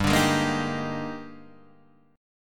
Eb Major 11th G, guitar

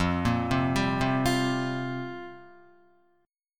F Suspended 2nd Suspended 4th F down Guitar; arpeggiated; JGuitar

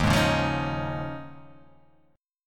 D sharp 9th Suspended 4th, Guitar; strummed; x,x,1,1,1,0,3,0; JGuitar

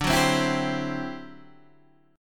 D 11th D, down, Guitar, Standard; D11 strummed; JGuitar

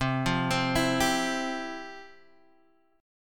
F Major 7th Suspended 2nd, chord

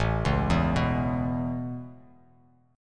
A Major Add 9th A, chord; Aadd9; arpeggiated; JGuitar

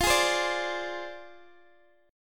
F Minor 6th Guitar DADGAD, chord; x,x,15,13,15,12; down; chord shape

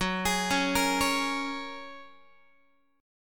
F sharp Major Add 9th, Guitar; F#add9 arpeggiated

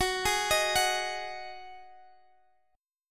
D sharp Minor Double Flat 5th F sharp

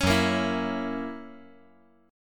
F sharp 7th

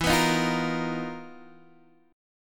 B Major 7th Flat 5th E, chord shape; BM7b5/E; strummed; JGuitar

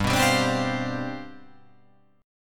G 11th

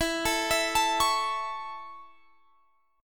A Major E

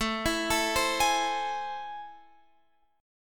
A Minor Major 7th, down

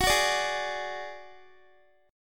Gb Suspended 2nd Sharp 5th, Guitar; strummed

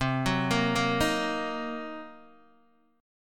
C Minor 7th Flat 5th C down Guitar DADGAD

